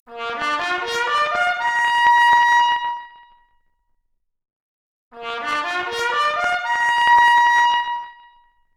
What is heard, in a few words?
Trumpet arp, Stereo output noised; 4bit